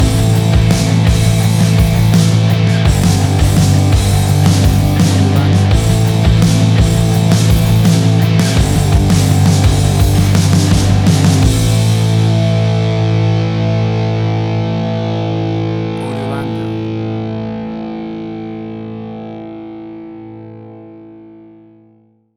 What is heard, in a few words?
Stadium Rock